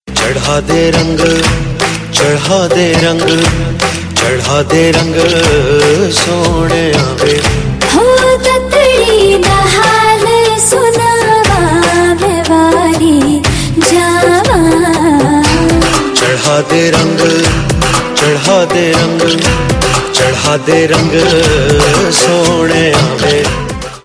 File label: hindi movie song; yamla pagla deewana 2011